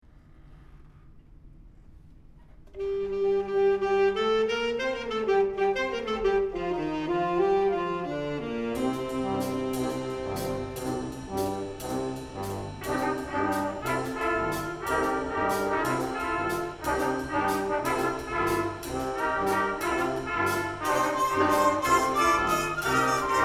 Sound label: bandancing waltz